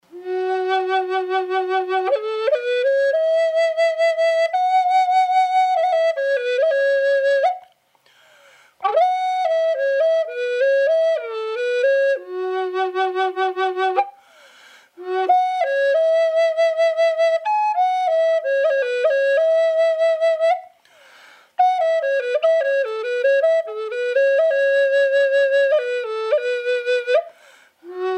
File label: F-sharp minor spalted tamarind dry, flutes